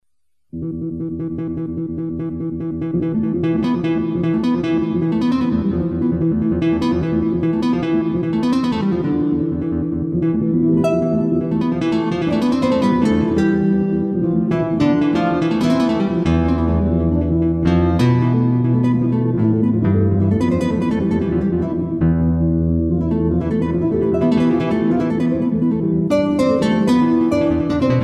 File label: Flamenco pequeno